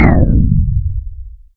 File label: shield off; AssaultCube; Alien Stars